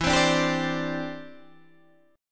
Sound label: E Minor 7th Sharp 5th, down, Guitar, DADGAD; Em7#5; strummed